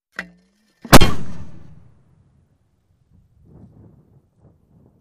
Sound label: German 88cm Mortar Ha, mortars